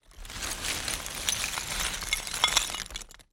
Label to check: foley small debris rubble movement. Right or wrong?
Right